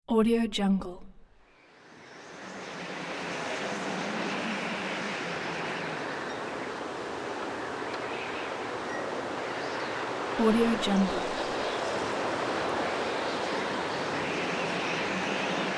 desert wind

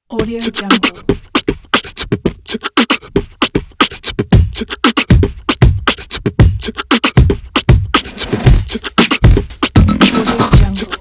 beatboxing

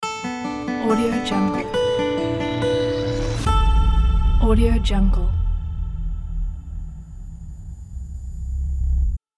uplift logo

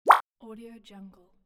notification

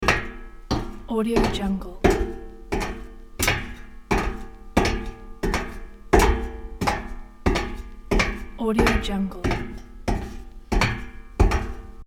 footsteps on metal; leksab

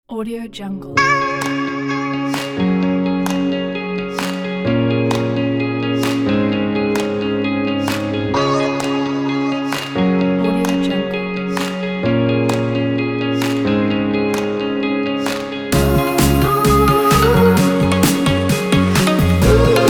upbeat summer pop rhythm

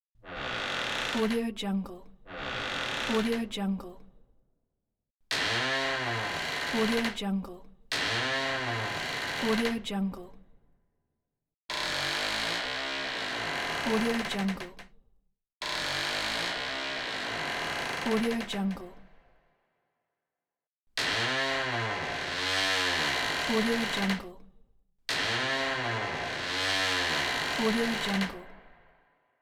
creaking door

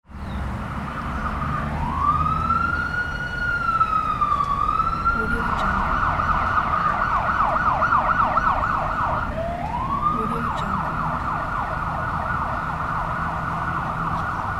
ambulance

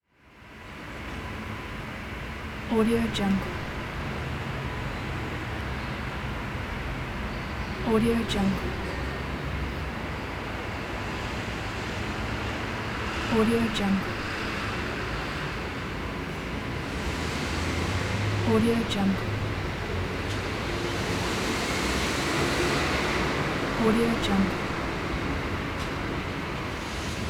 car parking ambience

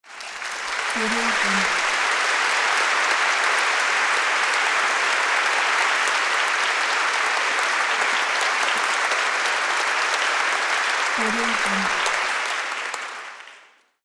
applause

